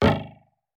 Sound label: hollow; Gonner